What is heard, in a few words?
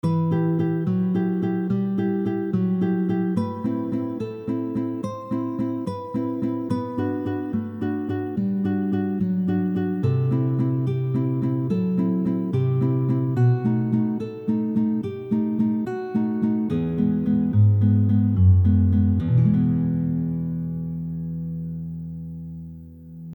Fingerstyle Composition